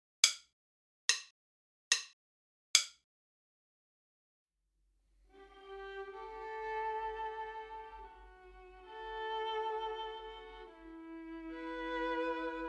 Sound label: minus Viola